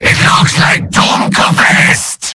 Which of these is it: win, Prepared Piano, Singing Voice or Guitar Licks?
win